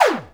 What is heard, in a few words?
Ion Cannon Bullet